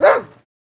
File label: Dog Bark Yelp Lower, golden setter